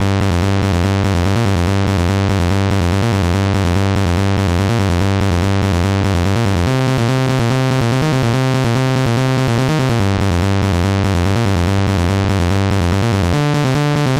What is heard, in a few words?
Level Music